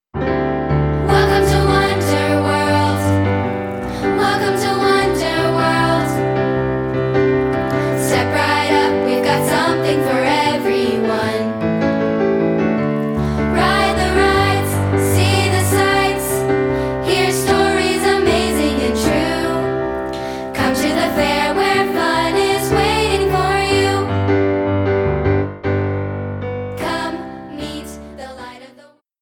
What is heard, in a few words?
piano